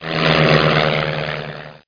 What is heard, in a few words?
fly by; flying